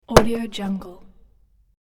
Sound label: dropping purse onto floor; hollywoodedge